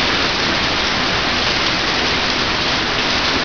rain loop; hl2

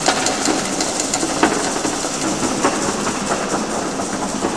Office; hl2

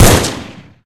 ar1 fire, weapons; hl2

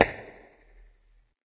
pound, antlion guard far; hl2

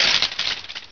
debris, weapons; hl2

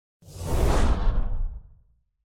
WHOOSH PASS SF LOW; Chupapsound; Essential Scifi

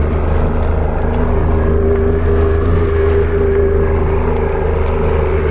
jetski throttle loop; hl2

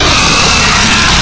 charge, antlion; hl2

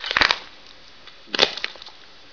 barnacle chew; hl2

